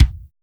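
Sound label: DEEP acoustic kick; POP-ROCK1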